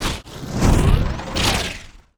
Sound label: wooshes transform sweetener